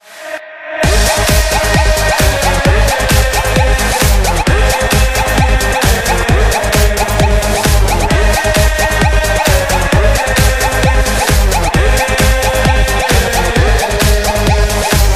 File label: Ringtone